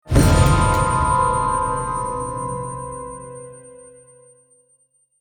Shock (Stinger)

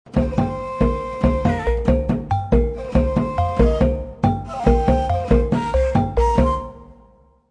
Mongolia Music; Civilization Revolution

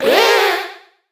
flower pain

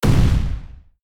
Wizard Firestorm Impact; gamerip; Idle Champions of the Forgotten Realms

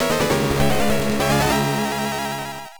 Surprise (Boss Jingle); Metal Gear 2: Solid Snake; MSX

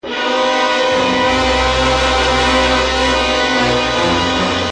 giant beaver; Pain; Mr. Pain; ps3

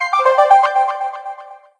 best time; Tiki Kart 3D

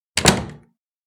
Door close

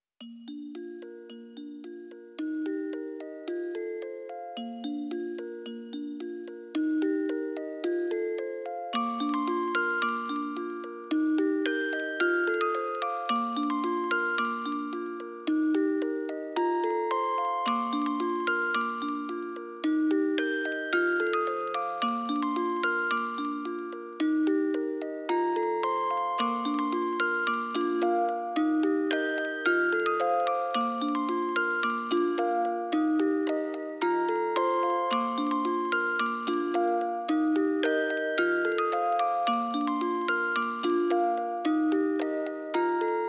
music box version; Natsuiro no Sunadokei

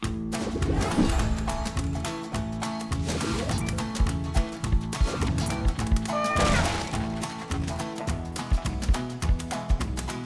Elephant; Disney Infinity

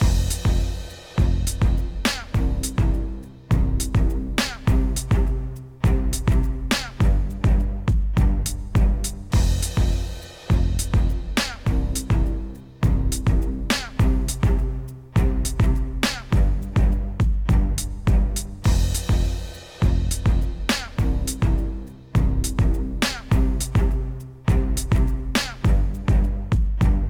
Comedy hero Base Loop

